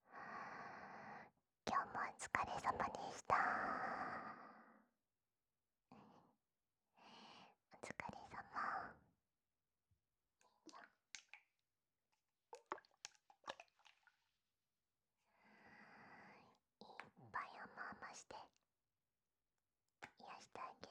オイルでトロトロ 耳舐め

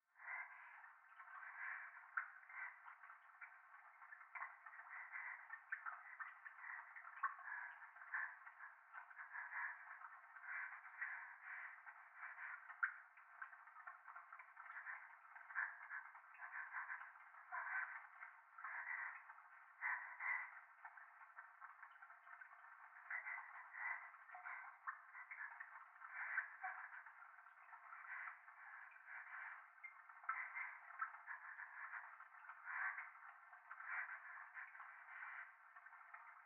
トイレ 右耳 快感 吐息 雨上がり

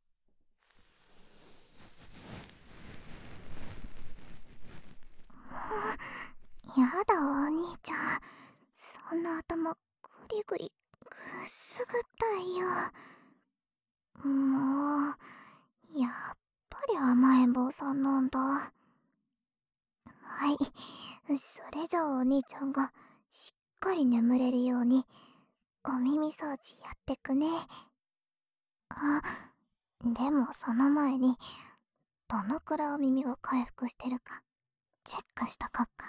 右耳かきパート, ASMR

